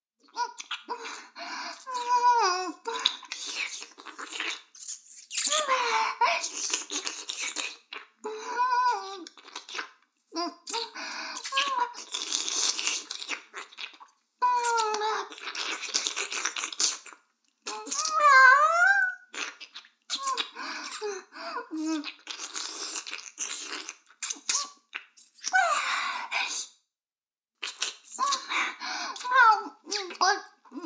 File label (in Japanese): 耳舐め音声, ASMR; 逆時間停止されて